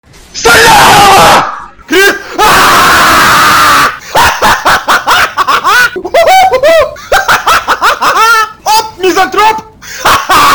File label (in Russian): muzyka dlya budilnika vstavay rabotyaga